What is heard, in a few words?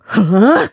Lady Stunned Recover; recovering; The Lady recovering (also used when searching for Link)